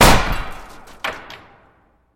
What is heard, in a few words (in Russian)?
gromkij odinochnij vistrel s otletevshim patronom